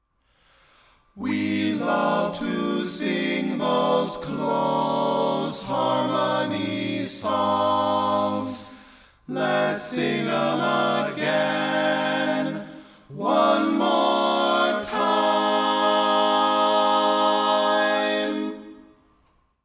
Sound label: barbershop multitracks